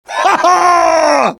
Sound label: laugh short; demoman